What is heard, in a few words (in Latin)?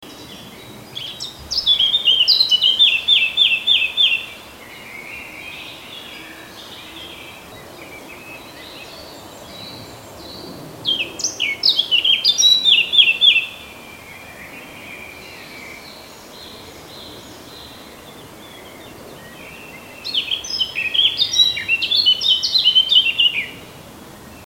sylvia atricapilla; vogel; hecke; biologie